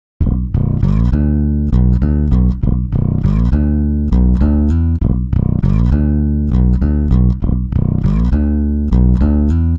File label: bass, pitchshift; celemony melodyne